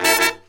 F# DBL HIT; E-MU Formula 4000 Series Vol. 1; Hip Hop Nation

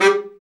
BRA BARI, Bariton Sax

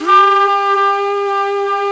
flute vox, singin' flute; FLT; Jazz; Roland L-CDX-03 Disk 1; Jazz+Singin'

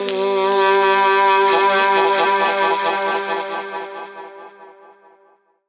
BLO SAX MU FX; Rawcutz; Nu Forms

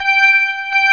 ORG, Organs

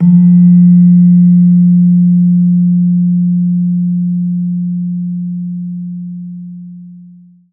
PELOG F1, PELOG BASS; Soundscan vol.60; AKAI

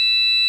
FARF COMBO, Electric Organs; E-MU Formula 4000 Series Vol. 3 – Analog Odyssey

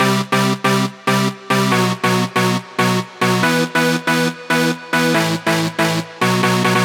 140BPM E; Vengeance - Essential Clubsounds Vol.4

